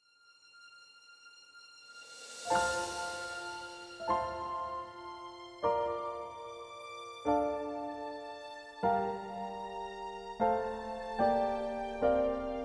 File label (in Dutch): sopraansolo